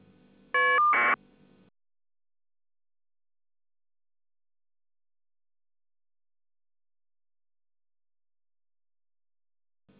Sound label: FSK